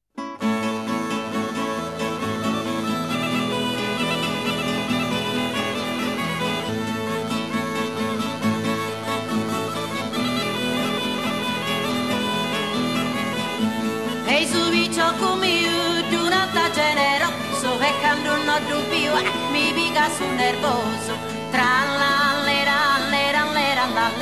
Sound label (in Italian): trallalera 'e campidanu